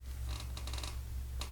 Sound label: Creak